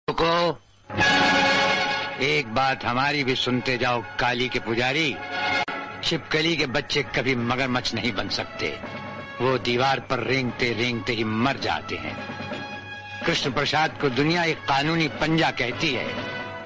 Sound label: Attitude Ringtone